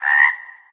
frog idle; animalsvotive; Minecraft; Votive 1.21.1; Votive Content

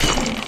break; Minecraft; Votive 1.21.1; Votive Content

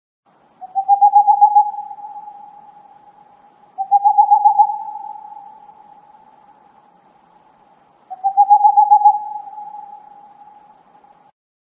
owl idle; animalsvotive; Minecraft; Votive Content

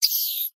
squirrel death; animalsvotive; Minecraft; Votive Content

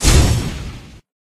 slash; minecraft; Votive Content

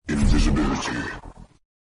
skill invisibility; minecraft; Votive Content

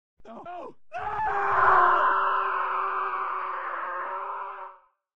torture; Minecraft; Votive 1.21.1; Votive Content

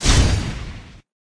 slash; minecraft; Votive Content

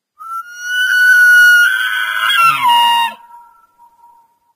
elk call; animalsvotive; Minecraft; Votive Content

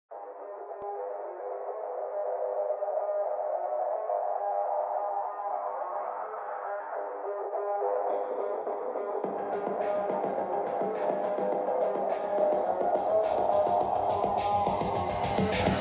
phonk; minecraft; Votive Content

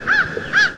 caw, crow; Votive 1.21.1; VotiveItemsAdder; votive crops

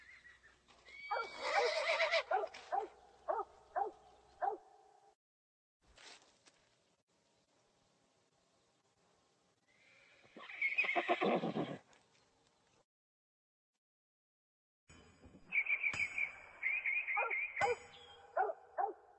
village; Minecraft; Votive 1.21.1; Votive Content

